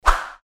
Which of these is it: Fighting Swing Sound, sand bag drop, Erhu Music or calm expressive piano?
Fighting Swing Sound